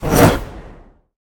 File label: basic swing; smite; thanatos